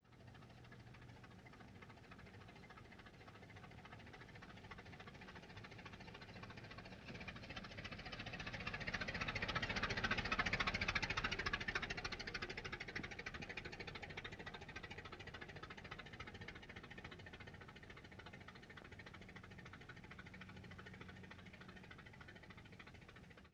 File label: Ford Model T Slow By Long Out; Period Vehicles